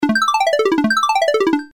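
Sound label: arp down; nord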